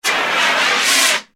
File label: Fire Extinguisher, Emergency, Spray; Hospital Sound Effects; BlastWave